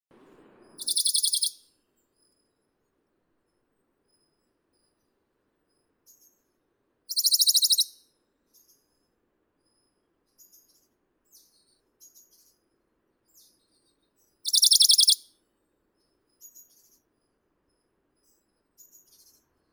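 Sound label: Bonelli's warbler, Phylloscopus bonelli; penochka belobruhaya; zvuki prirodi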